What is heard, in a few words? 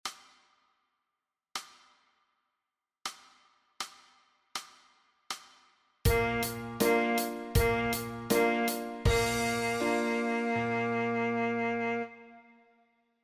sax G